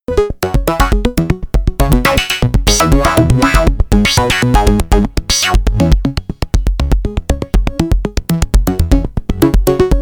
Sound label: Arturia MatrixBrute Analog